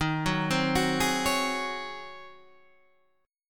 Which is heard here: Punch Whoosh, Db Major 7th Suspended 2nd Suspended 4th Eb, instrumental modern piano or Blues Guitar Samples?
Db Major 7th Suspended 2nd Suspended 4th Eb